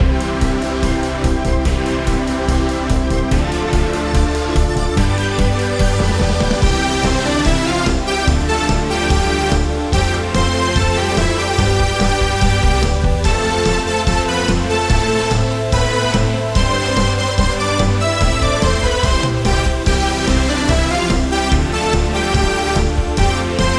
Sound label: racing game result best bpm140